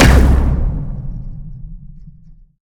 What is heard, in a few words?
pwr fire; smite; Anhur